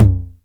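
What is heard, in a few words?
909 high tom tape; Toms; Goldbaby Tape808 & Tape909; The Tape 909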